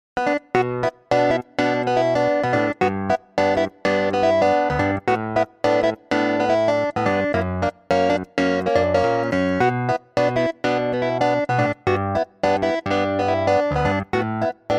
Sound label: Clavinet